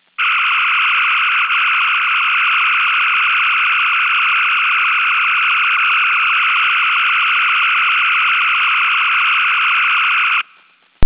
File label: phasers firing; startrek